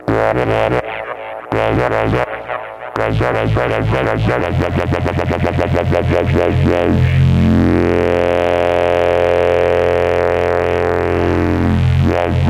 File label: Perfect Distortion